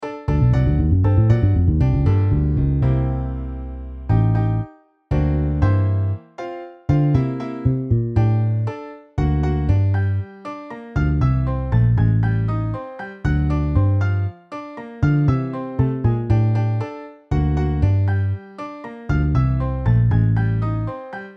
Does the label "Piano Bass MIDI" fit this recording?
Yes